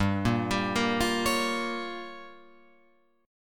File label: G Minor 7th Flat 5th, Guitar Open F; Gm7b5; arpeggiated